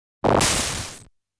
Large Enemy Explosion Sound; 1500 Series Collectors Box; Toaplan

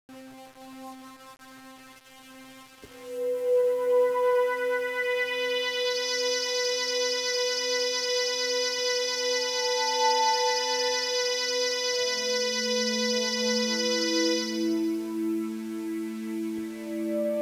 Music Mini Golf UFO

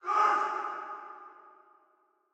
Shout Distant; Prison Architect

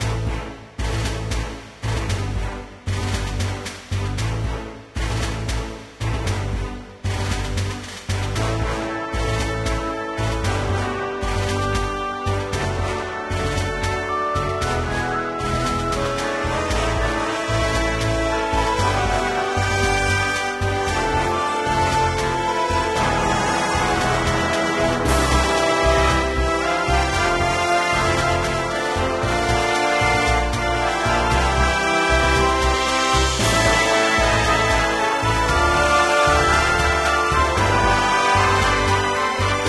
bgm craft time

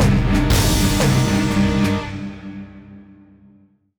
Dramatic Event Bosque

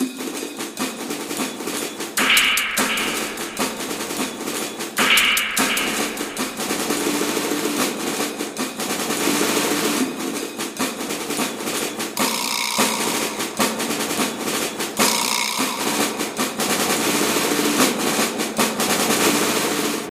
Sneaky Perc Loop; World War II Combat: Road to Berlin; Xbox

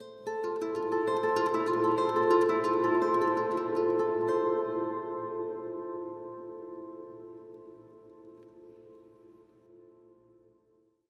Market Front

